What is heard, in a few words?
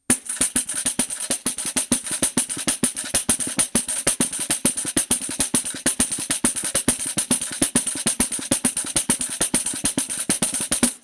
Pandeiro